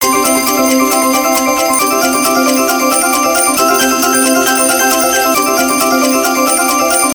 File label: countup; Grand X; slot game